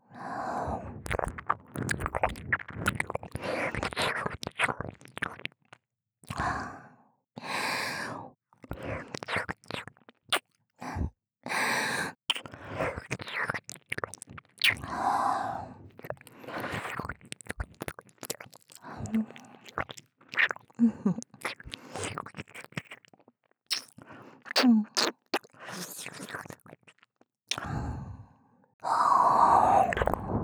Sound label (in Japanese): 耳舐め 左ループ; 和胜似男生性格而且懒散的褐色精灵♀不断卿卿我我的交尾日常。; 低音声